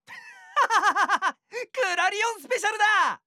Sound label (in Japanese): ハッハハハハハ！; クラリオンスペシャルだ！